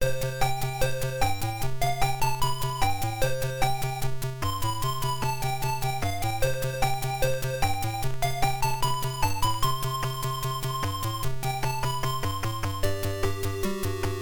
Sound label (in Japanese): メインBGM1 PSG版; fray msx remastered soundtracks 2008